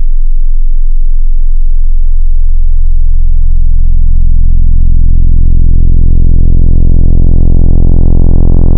Dist - Asym, Distorted wavetable

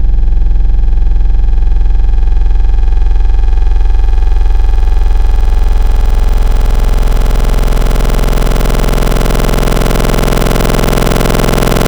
SYNTHETIC wavetable; Neu KatalYst

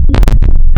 symetric; Adventure Kid

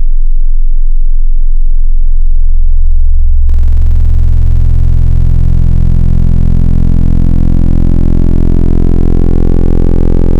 Dist - Diode, Distorted wavetable

